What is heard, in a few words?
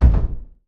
stomp